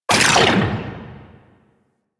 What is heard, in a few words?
Arch Railgun Mech; Warframe; Archwing; Weapons